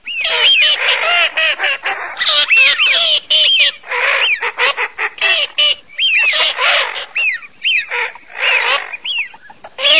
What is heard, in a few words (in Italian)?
fisch alzav, birdsound